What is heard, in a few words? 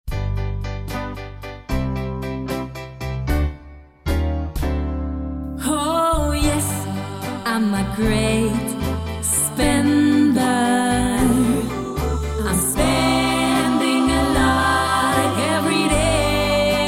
JINGLE; GREAT SPENDER